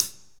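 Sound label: hi-hat, Techno Rock; Northstar; Drumscapes Roland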